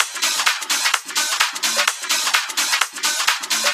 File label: Minimal Loops